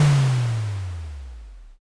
cannon

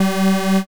wrong buzzer

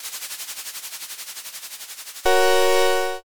answer roll

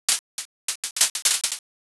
firecracker

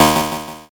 zap

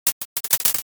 firecracker

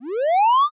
reverse

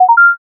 success

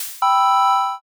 reveal

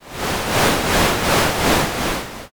murmur

